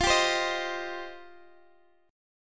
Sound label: F 7th Suspended 2nd F down Guitar Open D